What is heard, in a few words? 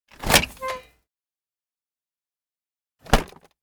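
refrigerator opened closed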